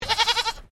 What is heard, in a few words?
Animal Goat Toggenburg Baa; Animals from Edgar's Mission farm in Victoria; Goat Sound Effects; Goats (Sound Effects:Animals); Foundation1